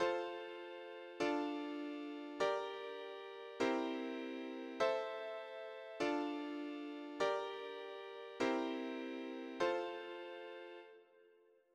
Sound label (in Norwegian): klangrykk